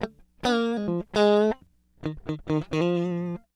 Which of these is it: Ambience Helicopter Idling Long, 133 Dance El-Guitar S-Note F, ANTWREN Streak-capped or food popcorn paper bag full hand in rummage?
133 Dance El-Guitar S-Note F